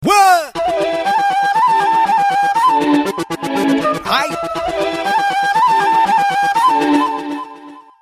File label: bindass flute sms; ringtones; hip-hop